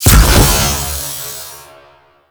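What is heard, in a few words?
Alt Projectile Explosion, Weapons; BE Tetra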